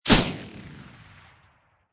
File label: Flare Gun Shot; LYN084 Guns & Military sound package by Lynne Publishing; Lynne Music